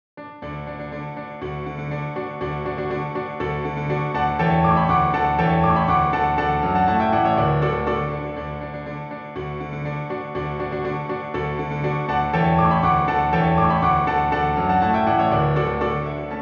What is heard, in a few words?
Contredanses for Orchestra; W.A.Mozart; 2 Contredanses for Orchestra KV.603 - No.1 in D; piano